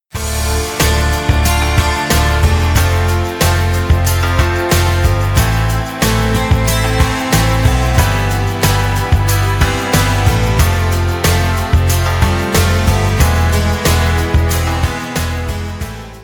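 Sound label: instrumental interlude; instrumentalnyy proigrysh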